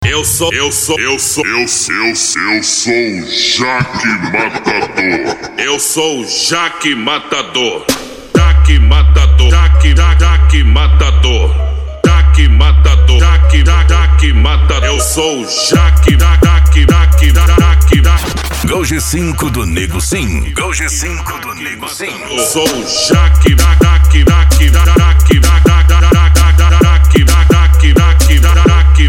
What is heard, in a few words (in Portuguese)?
funk mega bass e noia dance